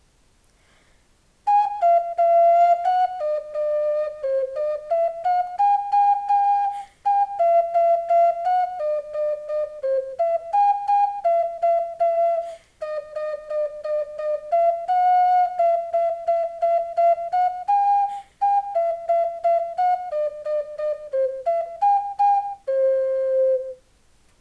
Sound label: ocarina